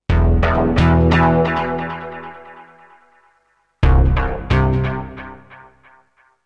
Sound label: Oneshot Synth